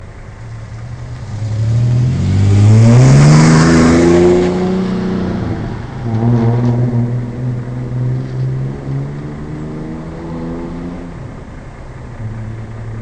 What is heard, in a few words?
Ranger Flowmaster Magna Cat Medium Throttle